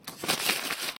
Homescreen Uninstall, ui; LG L-Fino

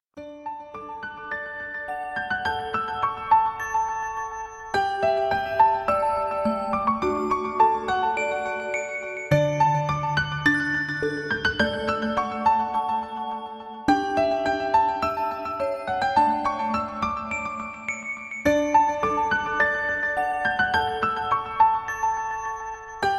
Piano, alarms; Tecno; Pop 9 4G

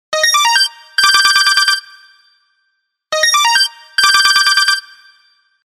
Basic Tone

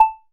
Electronic; Realme 8

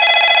Standard, Samsung SGH-i600

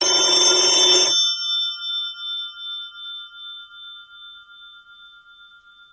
classic phone, ringtones; Sony Xperia M4 Aqua

